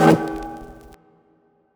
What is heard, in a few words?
Odd Stab